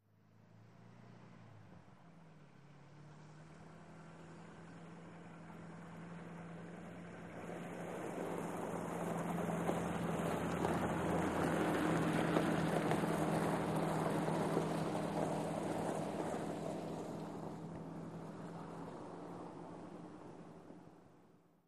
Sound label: Buick, By Medium Speed, Medium Distant On Gravel